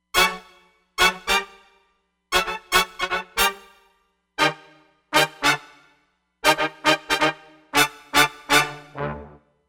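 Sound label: Pop Brass Staccato, Roland Fantom XR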